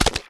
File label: Jelly Splat; Blastmorph Pinball; xbox series xs